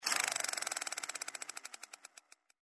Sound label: Spin Button; Candy Bona